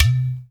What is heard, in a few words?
UDU; Spectrasonics; Heart of Africa